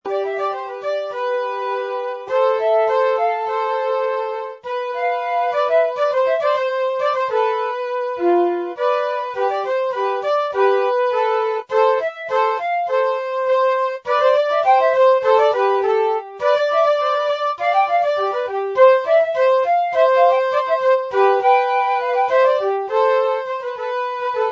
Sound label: Flute Duet For 2 Sparrows D